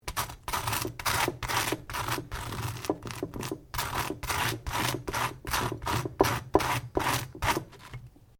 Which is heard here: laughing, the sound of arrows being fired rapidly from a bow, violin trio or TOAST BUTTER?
TOAST BUTTER